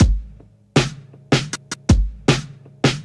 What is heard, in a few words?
tick tick tick, Boombap Drum loops